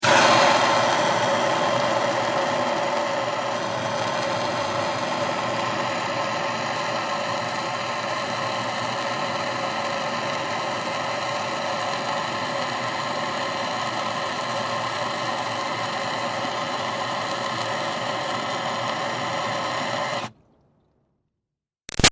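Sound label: Water Spray Interior Car Steady Hard; Car Wash; Spraying; Big Room Sound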